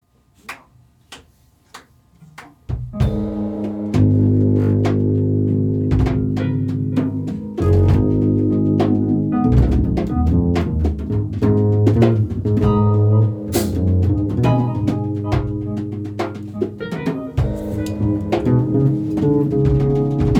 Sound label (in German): Solo Bass mit Cello Backing; Probe